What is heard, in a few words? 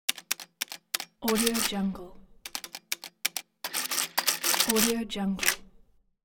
adding machine